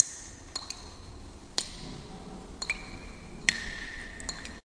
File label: water drops in cave; Sound FX Real Life